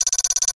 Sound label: technology data read out burst